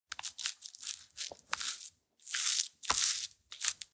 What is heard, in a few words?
Lotion tube skincare rub on face